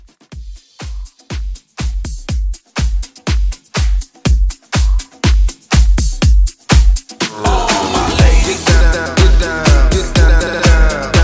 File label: House Hype Re Drum 122